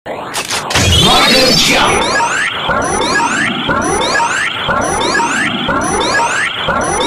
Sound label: jump